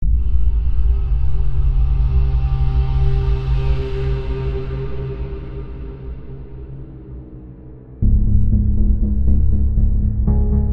Murder Drone; In Darkness

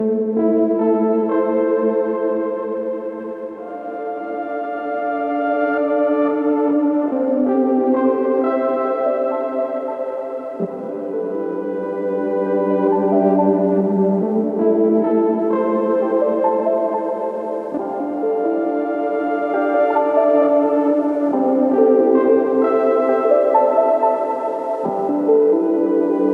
Music loop; Ch04 TheBoy; Arca's Path VR

